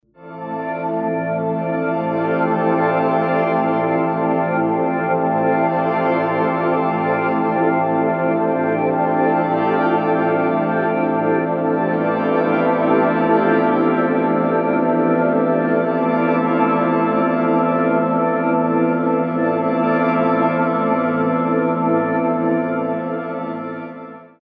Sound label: PAD